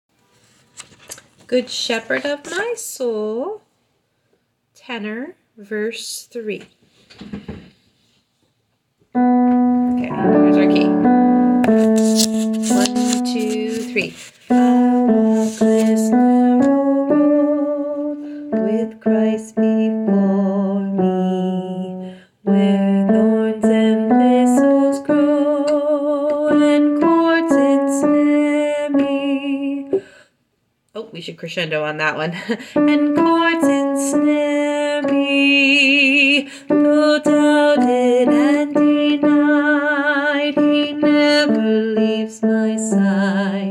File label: TENOR